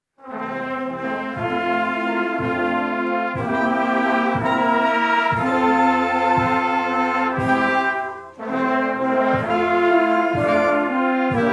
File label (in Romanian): Fanfara